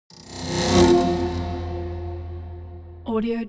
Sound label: cinematic swell haunted strings roll